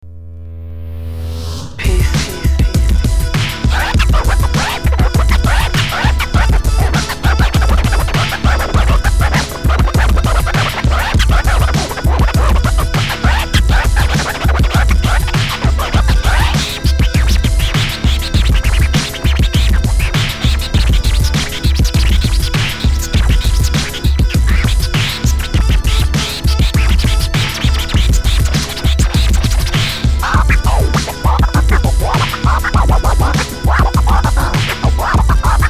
beat

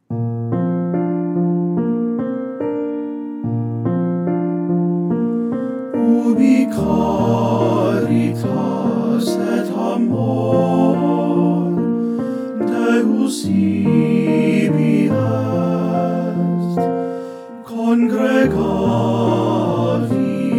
With Piano; Ubi Caritas

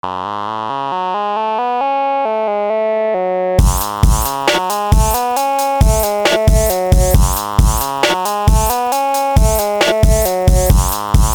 mini synth

